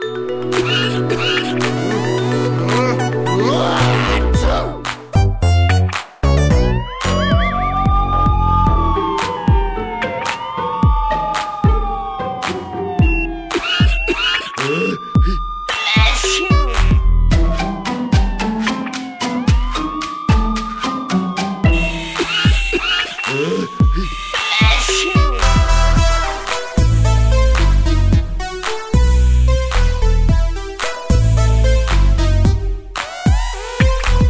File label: Music Menu